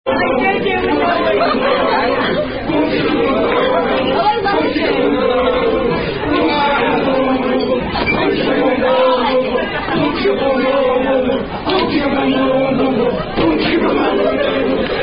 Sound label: Boom Chicka Boom Boom Boom Boom, Unknown Circle Game; Cfc-Youth Conference 2006